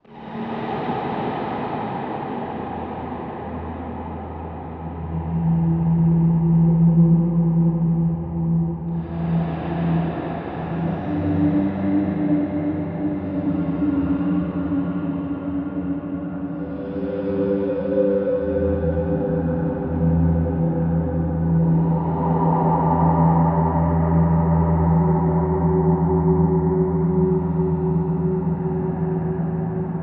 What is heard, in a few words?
haunted